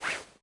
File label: Sfx Darts